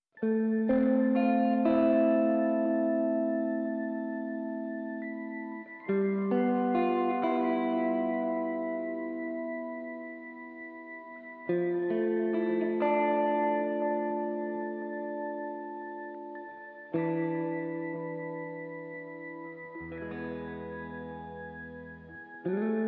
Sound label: guitar organ